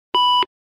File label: Computer Beep